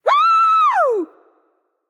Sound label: nv yay; smite; awilix